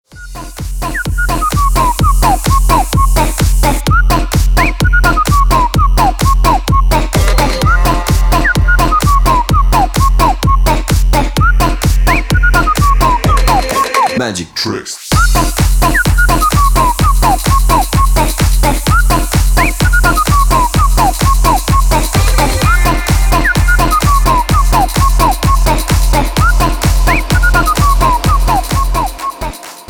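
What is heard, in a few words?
funny track for call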